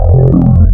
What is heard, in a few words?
Vowels 8va, wavetables; Animoog